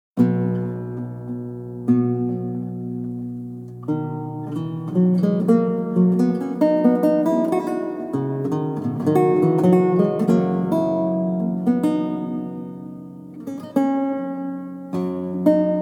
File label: Prelude, lute